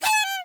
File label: Squeek; Five Nights at Candys